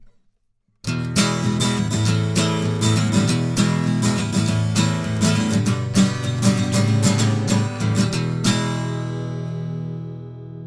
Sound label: Acc Gtr vintage nickel; Warm Audio